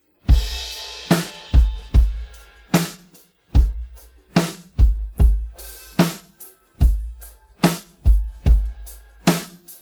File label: drums pattern